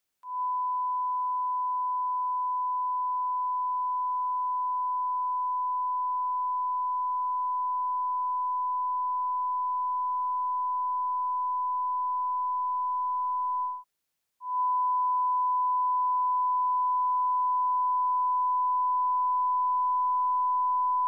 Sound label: 1 KHz L-C-R-S; The Sheffield - Coustic - Set-up and Test Disc